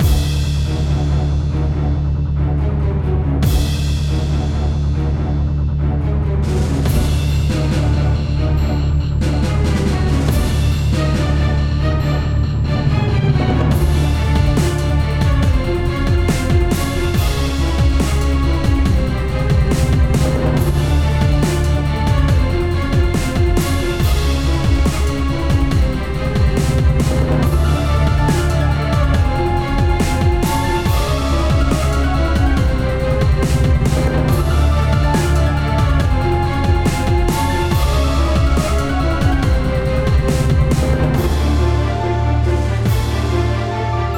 Prepare for Battle looped

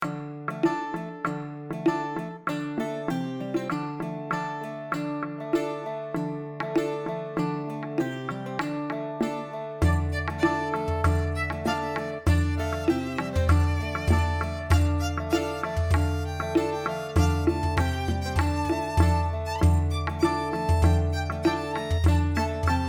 Folk Round; Total Miner: Forge; XBLIG; Xbox 360

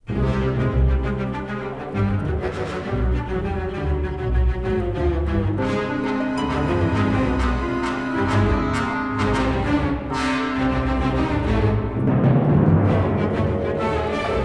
Tension; Star Wars Obi-Wan

